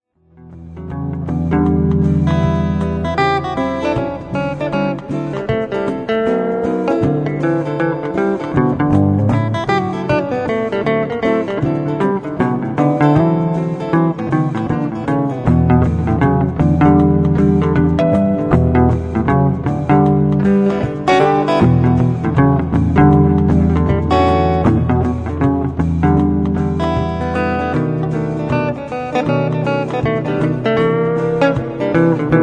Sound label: string Funk